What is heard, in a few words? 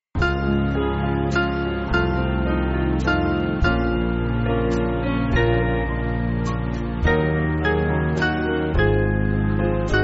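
Band, Backing Files for Churches; When I See My Saviour; Harkness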